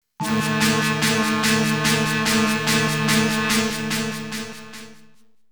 Start Scene BGM; OSHIE

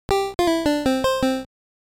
Slot Machine - You Lose; deja vu 1 2 the casebooks of ace harding 1999 gbc

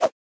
Spawnin Whoosh; Boomerang Fu

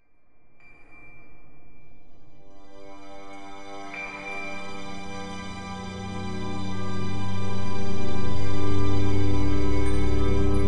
Dripstone Cave; Nehrim At Fates Edge

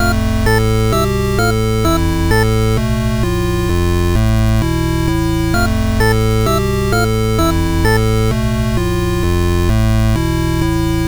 8bit

